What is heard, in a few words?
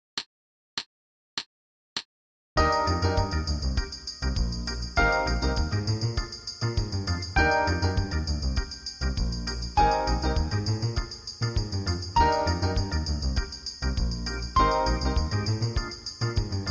E-flat Concert Whole Notes